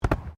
SOCCER BALL HANDLING; Soccer ball sounds; Sports

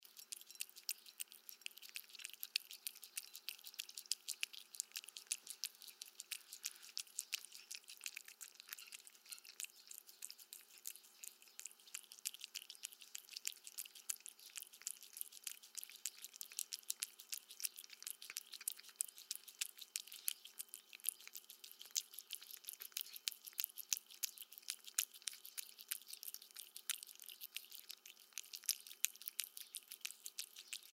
Cat House Eating, Cat House Eating Canned Food; Animal; Cat Sound Effects

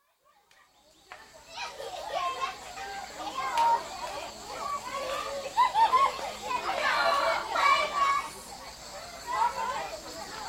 Zaire: Lisala, schoolchildren, with cicadas; Outdoor; BBC

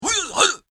Judo Grunts; human sound effect; Grunt Sound Effects

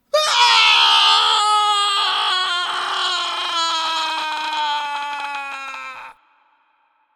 Male Scream, Screaming man.; Voice

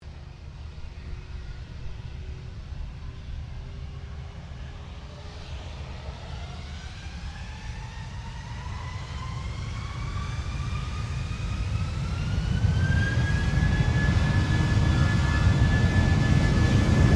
Airoplane Airliner A320 Airbus Takeoff, Airshow; Avalon Airshow 2009; Airplanes (Sound Effects:Vehicles)

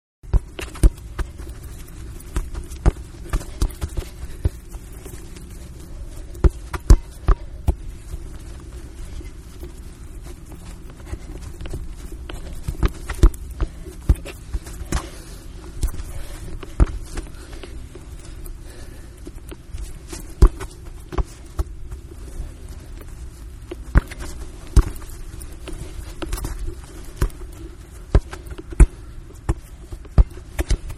Soccer-Dribbling Downfield Quiet; Sports; Manhattan Production Music

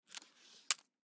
Scotch Tape Roll Undo Piece Tear Off; Office; Blastwave

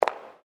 FOOTSTEPS WOMENS HIGH HEELS CONCRETE STEP

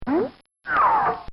Cartoon Sound Effects Zip; Cartoon Sound Design

